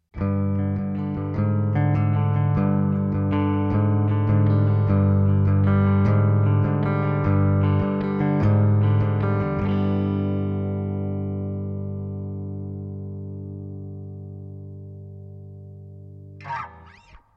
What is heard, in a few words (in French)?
clair arpege; arpège son clair